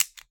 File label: Trigger